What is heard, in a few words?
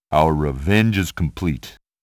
ALL CLEAR ~ GAME OVER; Taito Digital Sound Archives Arcade Vol.4